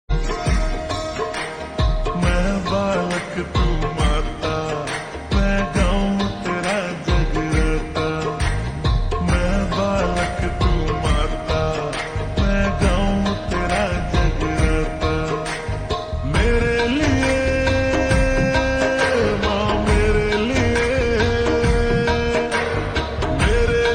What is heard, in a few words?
Ringtone Slowed Reverb